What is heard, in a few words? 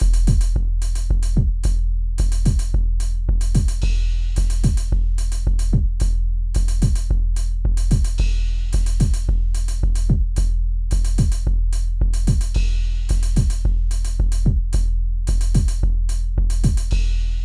drums